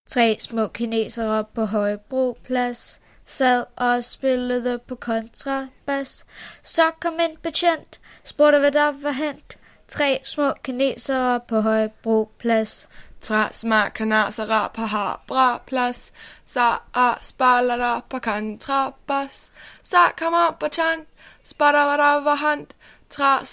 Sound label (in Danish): Lydøvelse sang